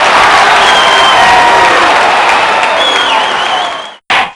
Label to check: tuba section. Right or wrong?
Wrong